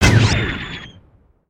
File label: Spider Boss Fire Rain Shot; creatures mechanical